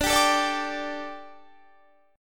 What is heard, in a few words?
D Suspended 4th